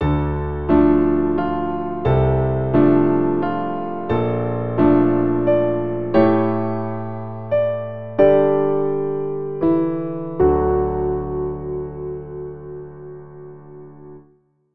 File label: Open Door of Temple of Time Piano; The Legend of Zelda Ocarina of Time